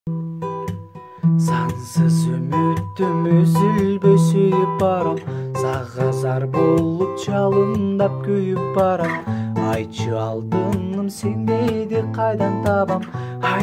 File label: gitara